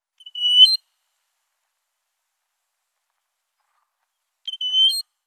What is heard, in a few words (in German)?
Amerikanischer Goldregenpfeifer Pluvialis dominica; Vogelstimmen